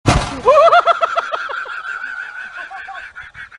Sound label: funny laugh